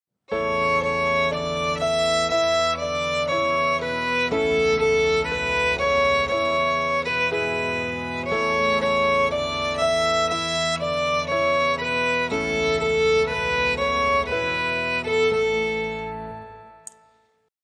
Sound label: Violin